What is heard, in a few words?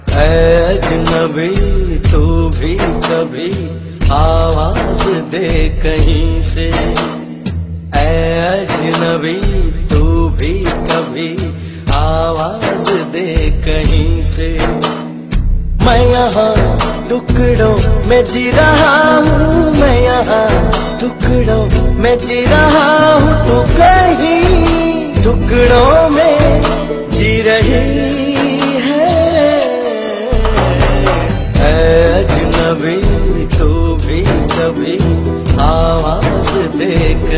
Old Hindi Song Ringtone